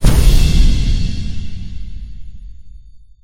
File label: win; Recode; rampage riches